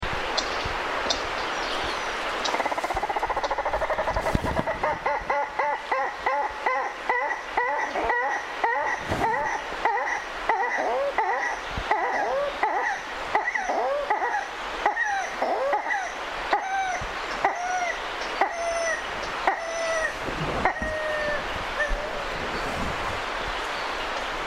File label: alarm call group